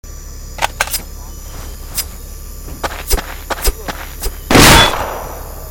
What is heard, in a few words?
Knife; The Henry Stickmin Collection